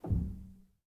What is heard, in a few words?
rel, Grand Piano; bitKlavier Grand; Piano Bar